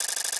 Ammo Replenish

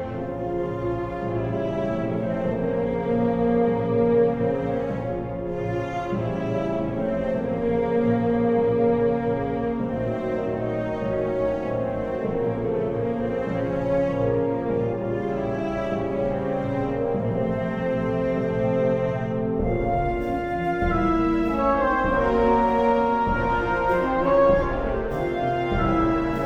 Wolf Loop

